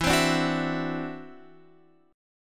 E 7th Suspended 2nd Sharp 5th E down Guitar Open F; strummed; chord; JGuitar